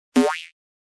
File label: doink